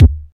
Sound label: original kick d sharp key, bass drum single shot tuned to the D# note; one shot